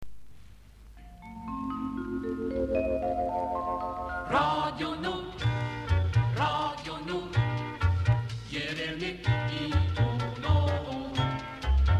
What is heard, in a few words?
jingle radio nord